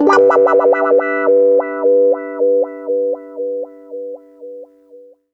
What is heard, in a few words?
120 WAH PAD, Electric & Acoustic Guitar Loops